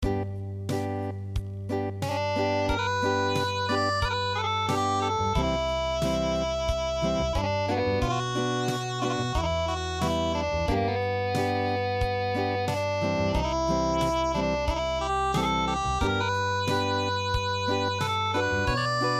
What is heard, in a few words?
Imjin Gan Unplugged